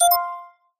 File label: hint